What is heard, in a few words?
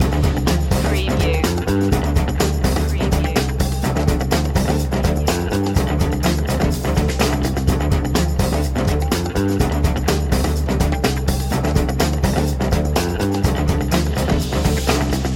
b-boy hustle loop